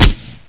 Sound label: fire 50BMG